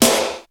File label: SNR; 90 sSampleCDs; Crypton - DrumBase5000; EFFECTED DRM; EUROPIAN